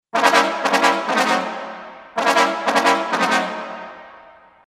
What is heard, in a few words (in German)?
Brass Solo Posaune stac triple tongue